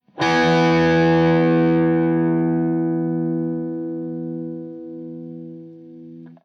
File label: e Power Chord 2 Notes 4th String, guitar